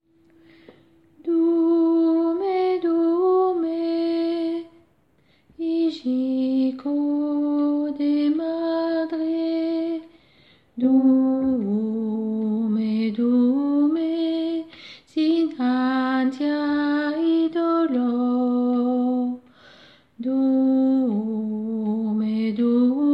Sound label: alto; Durme; 1er couplet